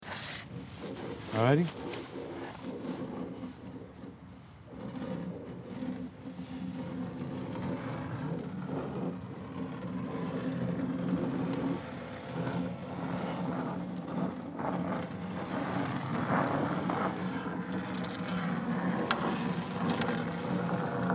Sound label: fieldrecording rock scraping; weeklybeats-2020